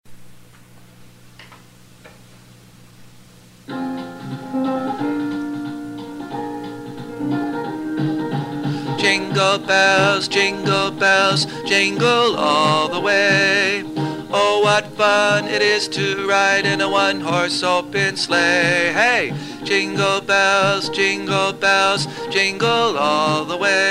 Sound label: jingle